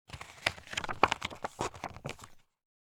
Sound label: paper rustle